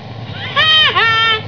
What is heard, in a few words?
haa haa; exclamations